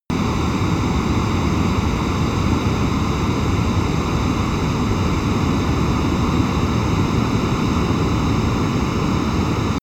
plane boeing 737 turbine idle close

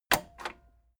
cassette eject click slow heavy

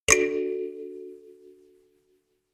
notification kalimba rattle triad major

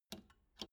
old button half pressed

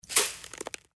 crackle

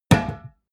glass door small close hard

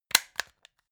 plastic remote battery compartment close heavy

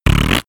fart deep

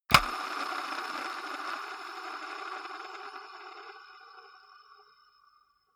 gaming mouse wheel scroll smooth

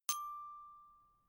notification glockenspiel single low

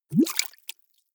bubbles hard

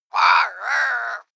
bird raven

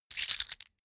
key ring large grab

